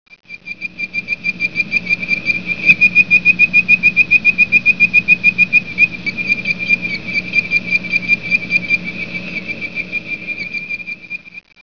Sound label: bird voice